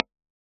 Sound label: VX AC30 2x12 Alnico Silver, R121 SM545; FULLNESS